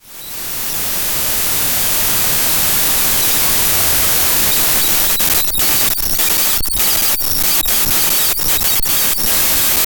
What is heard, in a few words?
something went wrong fail, system